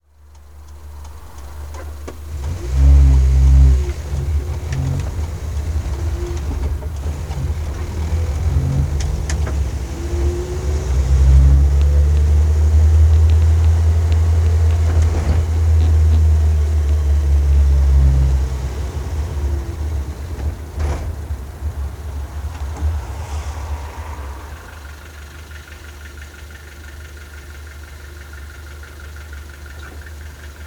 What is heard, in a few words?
R4 Goes To The Park, Renault 4